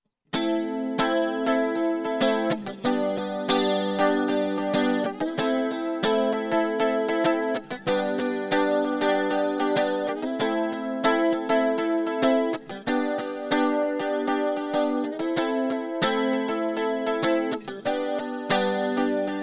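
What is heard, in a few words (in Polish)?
rytm samby mniej dzwieku, guitar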